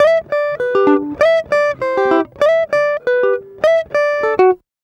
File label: Acoustic Guitar 99 bpm G, African Guitars; Quazibeat; African Secret